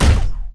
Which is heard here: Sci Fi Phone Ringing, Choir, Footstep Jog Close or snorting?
Footstep Jog Close